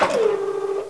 heavy platform stopped

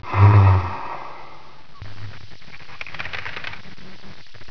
step

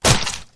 head hit

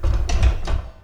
hatch locked

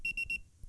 police pager

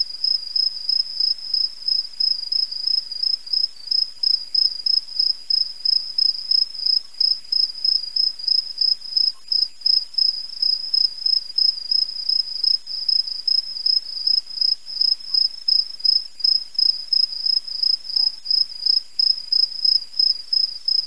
crickets night

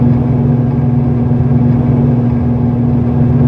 fan heavy

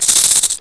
rattle, headcrab poison; hl2

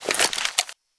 deploy, weapons; aps; paranoia

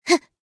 jump

